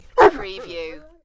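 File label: Big Dog Bark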